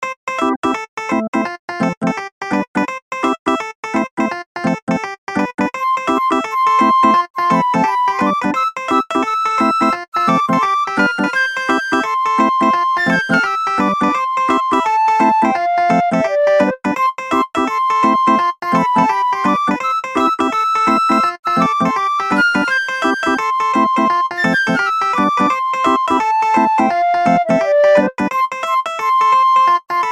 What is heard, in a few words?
MIDI blues